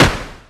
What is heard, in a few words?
punch